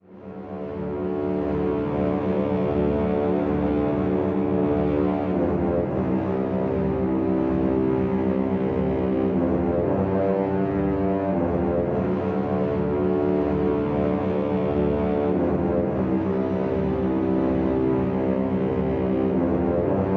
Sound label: War March